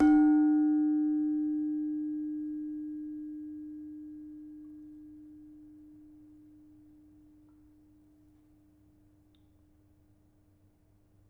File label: Demung, gamelan